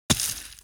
dirt kick

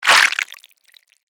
squish

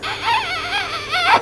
KISS

